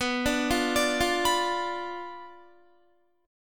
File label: B Diminished B down Guitar; arpeggiated; tuning 4F,4A,5C,5G,5C,6E